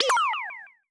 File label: exclamation mark